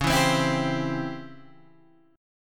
Db Minor Major 7th Flat 5th Db down Guitar Standard; DbmM7b5 strummed; JGuitar